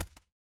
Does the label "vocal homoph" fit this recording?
No